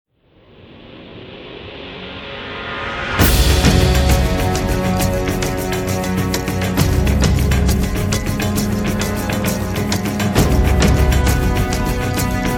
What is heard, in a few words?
Helicopter Chase; CSI Miami